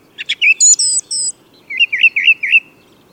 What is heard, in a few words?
song thrush